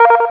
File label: sfx checkpoint; Playman Extreme Running